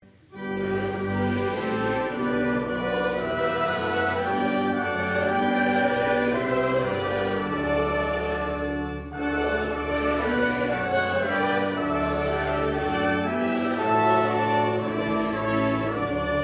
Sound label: trumpets